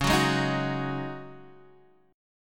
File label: Db Suspended 2nd Suspended 4th, Guitar, Standard; x,4,4,1,4,2; strummed